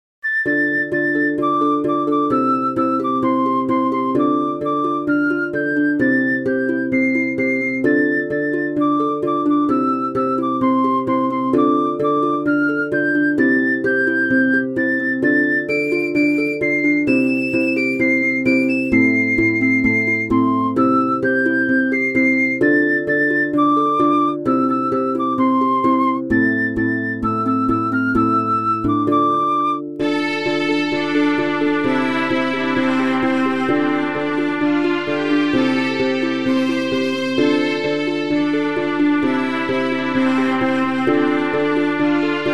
as i roved out irish scottish traditional flute strings; dal flute; syntheway strings; chordophonet virtual